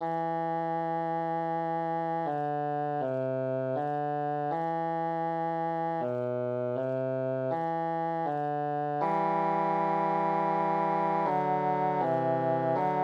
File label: canon in e minor; juvenilia; melodyassistant